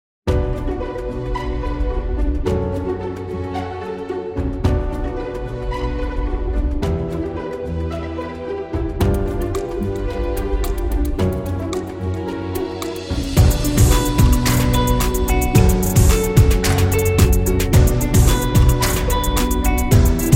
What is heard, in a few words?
Cinematic Hip Hop